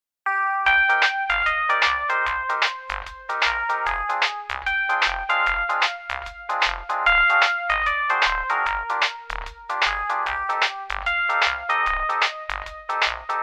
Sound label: Lo Fi Remix